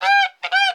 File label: dodo death